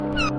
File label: Boat Door